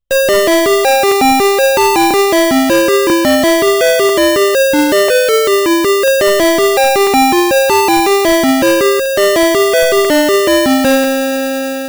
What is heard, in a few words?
Susanna